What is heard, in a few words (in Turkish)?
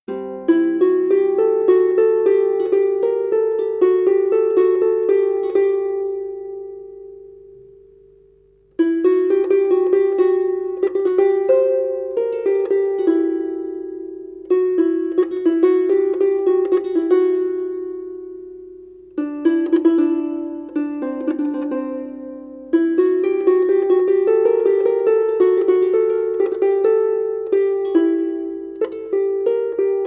ceng taksimi